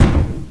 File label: door bang; ambience; caged